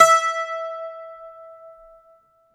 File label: Greek Bazouki, Plucked Strings